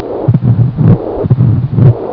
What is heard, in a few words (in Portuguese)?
estenose regurg mitral, sons cardiacos